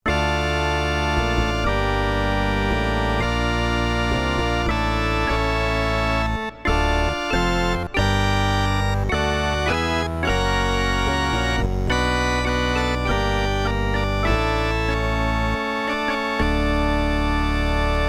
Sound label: PPG Wave Computer 360 Church Organ